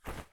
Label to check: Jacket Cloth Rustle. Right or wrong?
Right